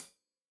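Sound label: hat closed, hi-hat cymbals stereo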